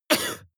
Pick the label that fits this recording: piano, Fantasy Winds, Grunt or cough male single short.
cough male single short